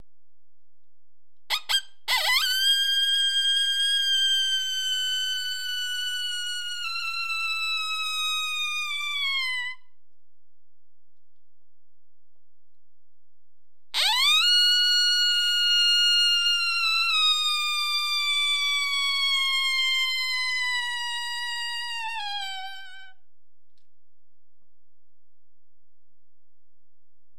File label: Bees Howl